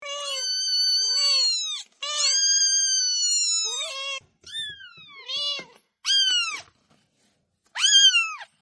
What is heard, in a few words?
newborn kitten sound